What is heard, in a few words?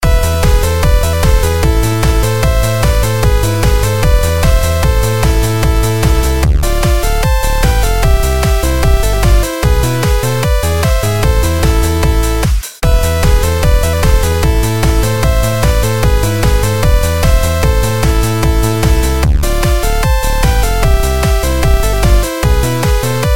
Tetris Electroremix